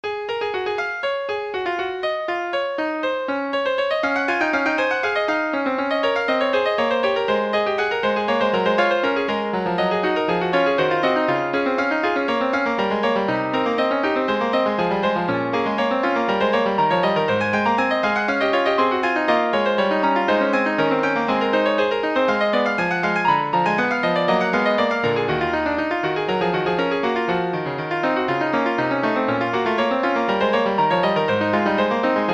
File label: piano